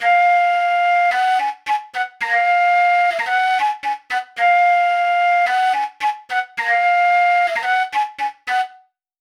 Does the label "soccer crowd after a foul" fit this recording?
No